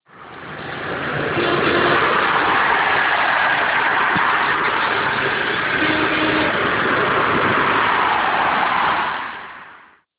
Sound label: autobahn